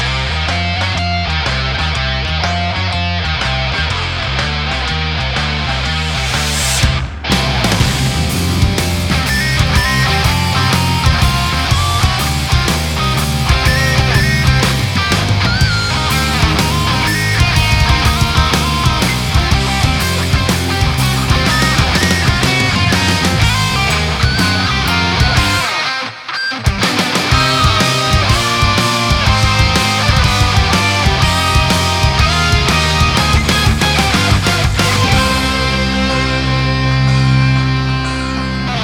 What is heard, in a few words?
deep blues; crypt of the necrodancer